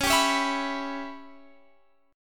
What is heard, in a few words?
E Minor 6th C sharp chord